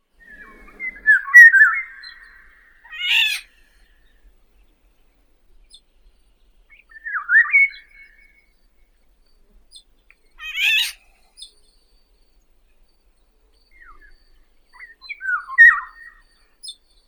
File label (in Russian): ivolga obiknovennaya oriolus oriolus